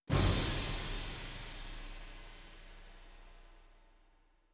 cymbals; Netease; kill boss tortoise

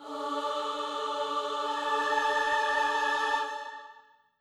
women; Netease; kill boss phoenix

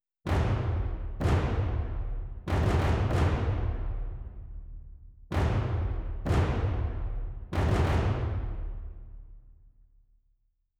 bass drums; combat results win; Netease

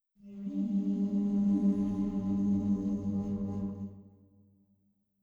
ambience; Netease

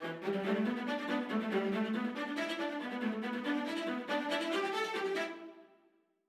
violas; Netease; morale full

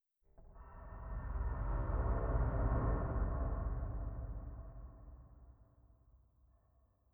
ambience; Netease; gank

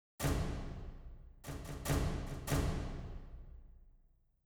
perc hits; Netease; gank

